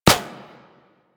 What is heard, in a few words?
Sentient Projectile Hit; Warframe; Sentients; Symbilyst; Weapons